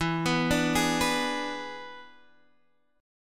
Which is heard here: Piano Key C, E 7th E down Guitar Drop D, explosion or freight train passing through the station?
E 7th E down Guitar Drop D